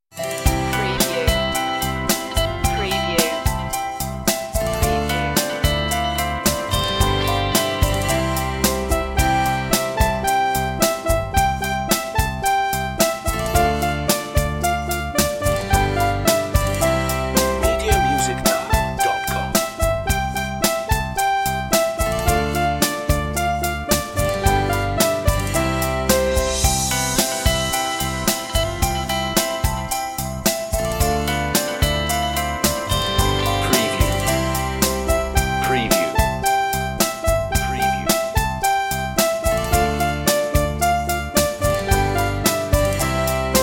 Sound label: instr